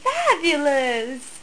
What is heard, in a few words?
ouch; 3D Klondike 1.0a